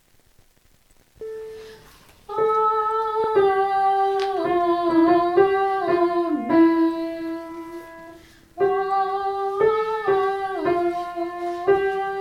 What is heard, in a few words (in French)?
Amen Soprano